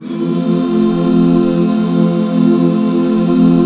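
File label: F major, Back Chords; Sound & Vision - Gigapack II CD 1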